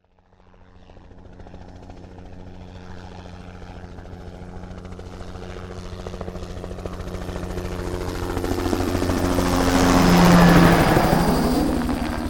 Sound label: HELICOPTER